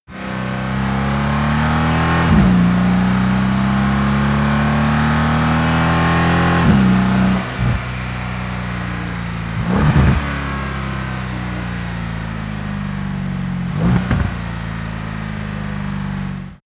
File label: car mercedes amg c63 s accelerating decelerating rev